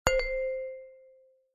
multimedia alert notification ui ping chime mallet like; zapsplat